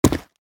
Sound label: Footsteps on street boots single step